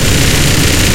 mg81 real loop right, guns; War Thunder

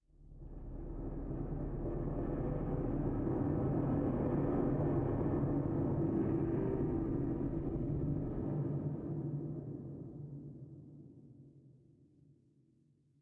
sting metal groan; Rust

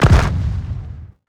impact flesh fast

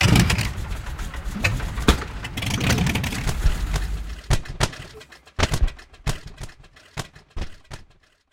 overheat; War Thunder

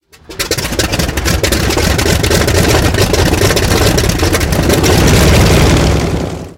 engine start, engines; War Thunder

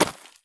landing gravel; Escape from Tarkov

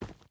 footstep boots forest run; Rust

